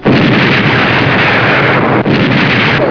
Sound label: BOMB; 特酷声音素材库; 战争; 炸弹